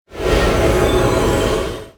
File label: nature magic underlay